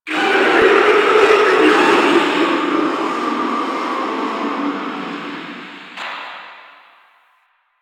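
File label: Infested Distant; Warframe; NineteenNinetyNine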